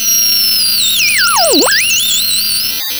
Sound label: sound of a bike bell